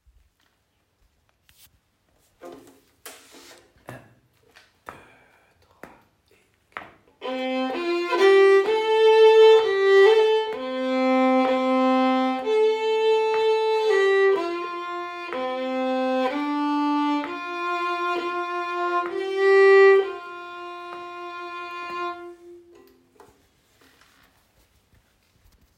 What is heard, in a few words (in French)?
Balade irlandaise violon; Balade irlandaise 3